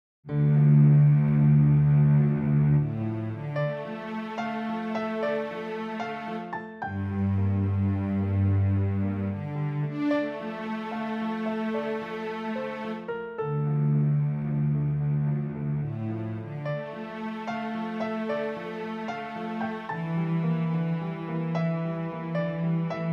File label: Piano Trio